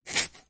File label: foley long hair cut with scissors single cut; zapsplat